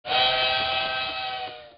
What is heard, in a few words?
train horn